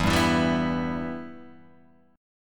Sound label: E-Minor, Guitar, Open G; 2,0,2,0,0,2